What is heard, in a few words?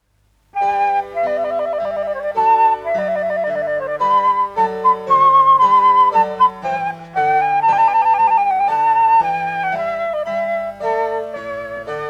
Creamsonata H minor - I. Largo - vivace